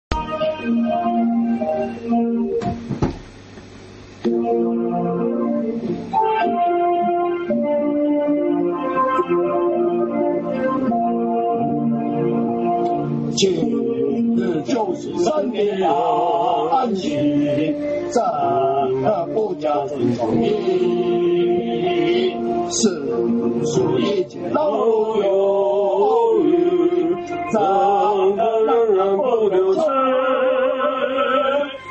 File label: hymn; worship